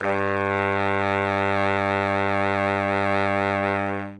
notes g, sax; Dirt-Samples

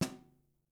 snare ghost, gretsch; Dirt-Samples

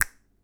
hand mono

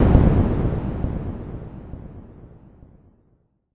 lo exp; armora

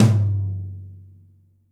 lotom, gretsch; Dirt-Samples

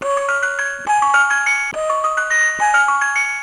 Toy Notes

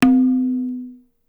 tabla; Dirt-Samples

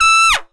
trump stab e

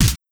kick less human

